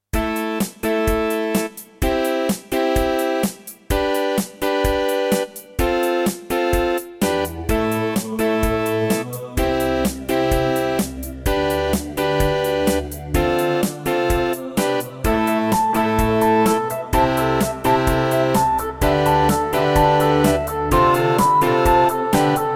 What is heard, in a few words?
Yamaha DTXpress; raptor call of the shadows